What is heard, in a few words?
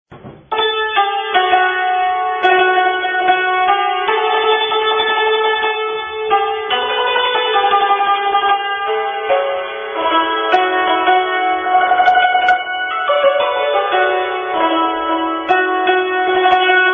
Santoor